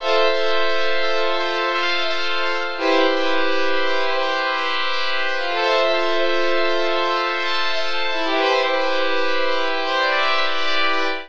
Talking Vibrato Keys A Minor 85 bpm; Accompaniment; Tonal; MIDI Clips; Drive and Glow; Ableton; Factory Packs

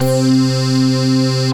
Synth Brass, Synth Keys; Wavetable; Juicy Fruit SynBrass; Ableton; Synth Essentials

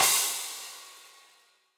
Crash 17, Cymbal; Drums; Drum Hits; Standard Hits; Crash 17o14 Studio Basic; Session Drums Studio

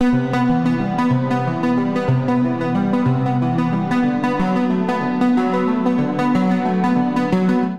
Keys C Major 123 bpm; Accompaniment; Tonal; MIDI Clips; Past; Drive and Glow; Ableton; Factory Packs

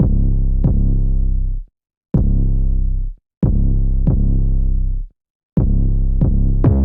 808 Bass C 70 bpm; Jaded

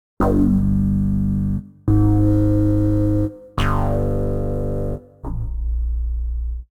Bass Wave Selector C Minor 143 bpm; MIDI Clips; Tonal; Bassline; Skitter and Step; Ableton Factory Packs

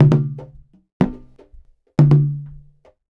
122 African Juju; World; African Percussion

